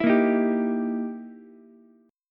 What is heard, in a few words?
E Minor Major 7th Flat 5th, E, down, Ukulele; strummed; JGuitar